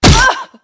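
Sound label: bullet hit helmet female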